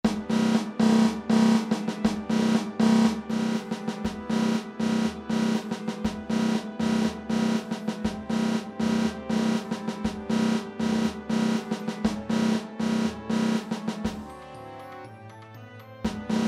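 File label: snare drum; caixa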